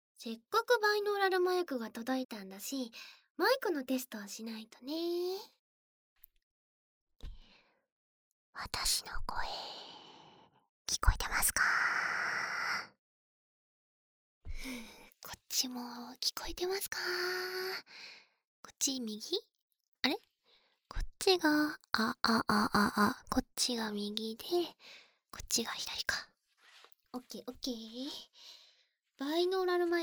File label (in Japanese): 耳舐め 練習, ASMR